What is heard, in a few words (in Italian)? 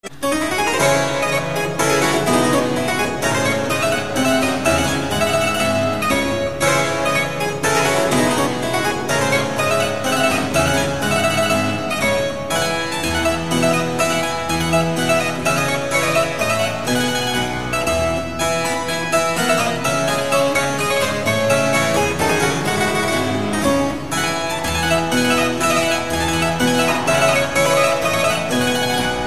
tutti i registri; Taskin